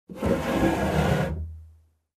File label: Opening wooden sliding door